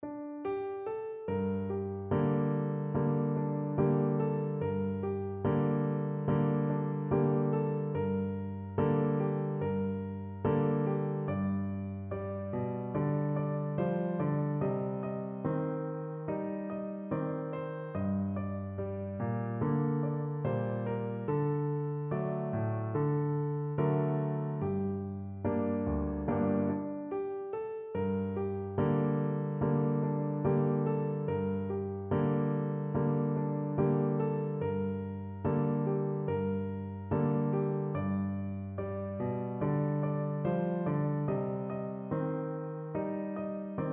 PNO

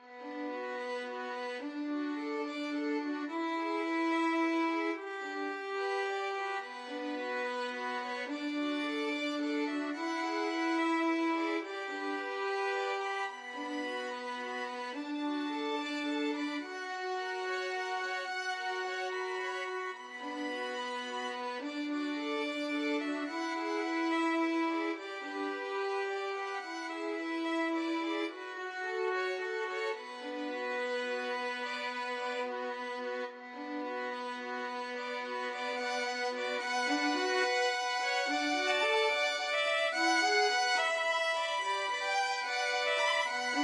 violin trio; house of the rising sun

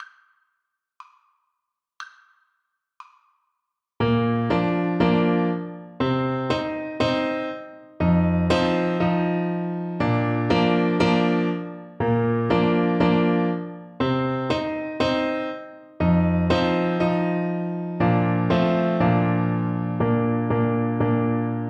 Trombone

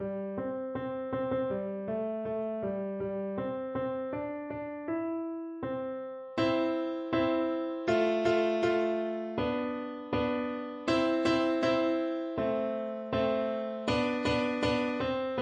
Bingo PNO, piano

